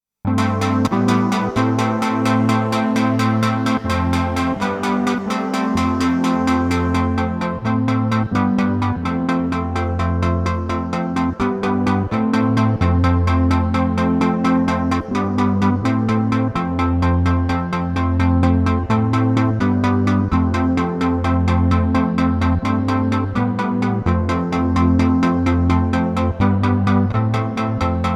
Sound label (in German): DM12D Aus dem Club Sequenz